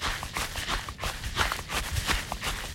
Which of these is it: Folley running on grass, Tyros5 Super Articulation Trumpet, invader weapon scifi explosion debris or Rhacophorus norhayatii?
Folley running on grass